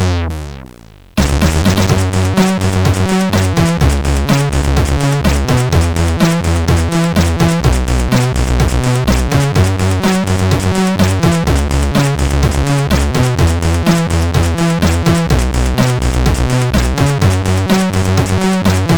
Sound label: Little bass, CSG8580R5